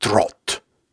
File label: Syllables and Imaginary Words, Viking Vocal, Hard